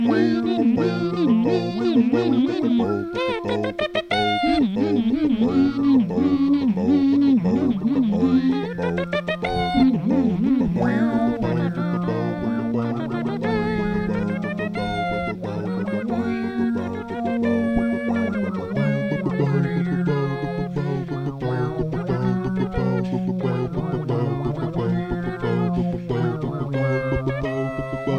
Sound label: vocal cover; legend of zelda